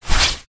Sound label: raincoat sleeve arm movement walking single; foley